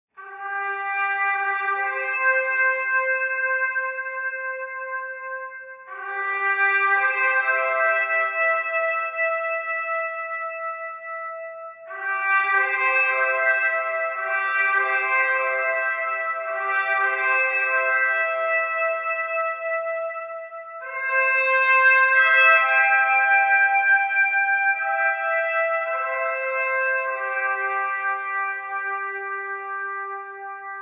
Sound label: Trumpet Taps; Hatred